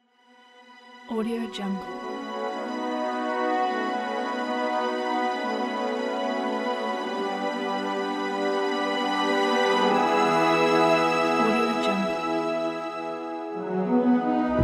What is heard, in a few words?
4th of july pompous